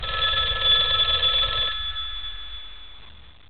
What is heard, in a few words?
phone ring; matrix